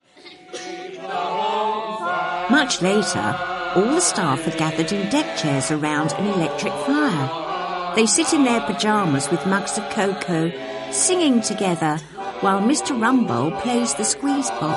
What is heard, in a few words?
song